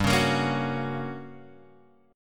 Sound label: G Minor Major 7th Flat 5th G, Guitar Open G; chordshape; down